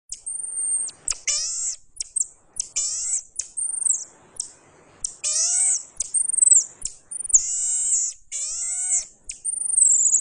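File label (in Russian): Игрунок игрунка карликовая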